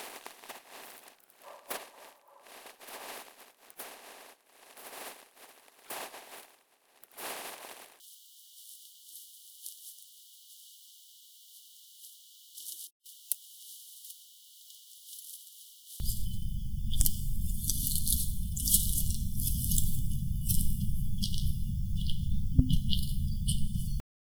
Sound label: foundobjects